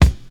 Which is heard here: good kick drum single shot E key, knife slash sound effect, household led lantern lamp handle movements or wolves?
good kick drum single shot E key